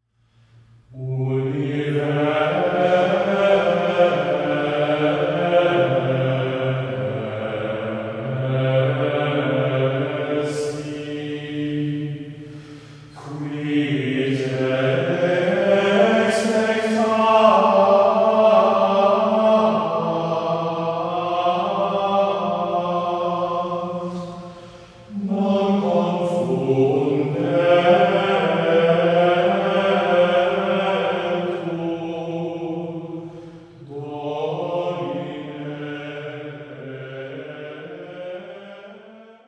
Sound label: chant